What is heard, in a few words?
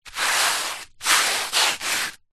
footstep scrapes scuffs sandal concrete light dirt; foley; zapsplat